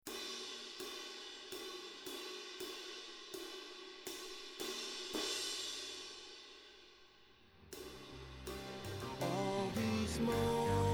ride cymbal

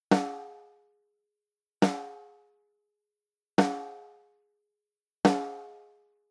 RND511 straight, snare drum; preamps

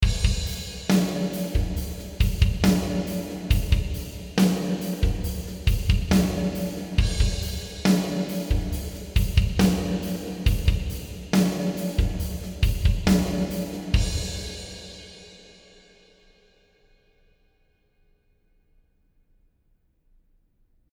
drums racquet

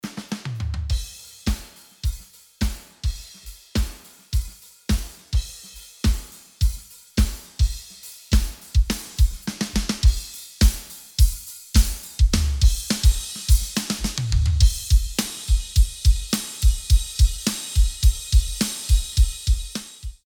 Drums; manley; pultec shootout

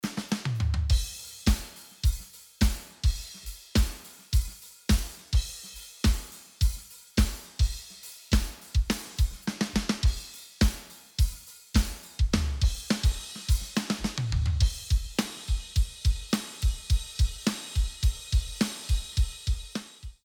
Drums flat

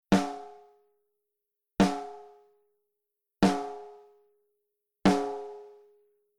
LaChapell583s high input low out, snare drum; preamps

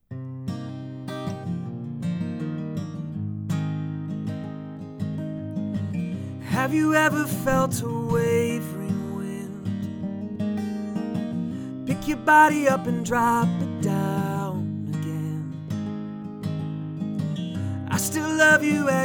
Music Samson GTrack Omni; usb mic

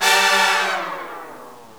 long fall

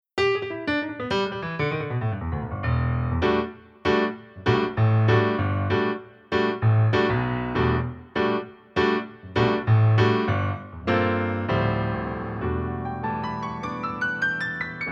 Studio Grand, virtual piano; EZKeys; EmJam Toon

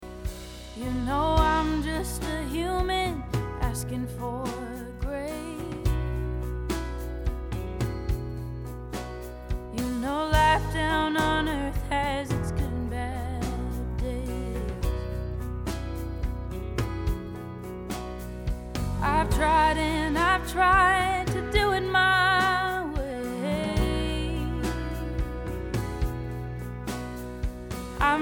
Track Telefunken 251, virtual mic

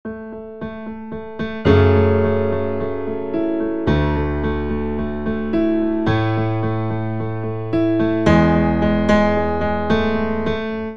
piano; Creating Great Sounds in your DAW

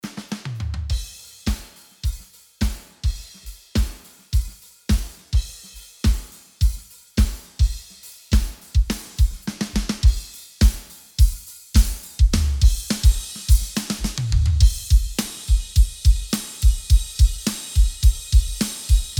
Drums; retro; pultec shootout

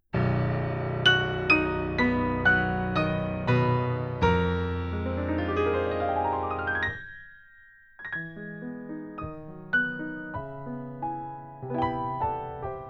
AKG 414, record a piano; how to record a piano

